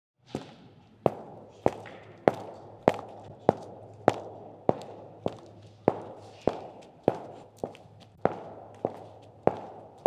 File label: Footsteps Cowboy Boots In Empty Warehouse